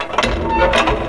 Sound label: door op; 3DGameStudio